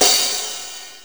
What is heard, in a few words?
Sp 1200 Crash; Drum Hits; Crashes; Nu Dance Electro; Zero-G; Soundclash